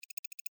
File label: mail sending